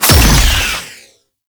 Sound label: Slinky Dead Zone Cast; Warframe; ManInTheWall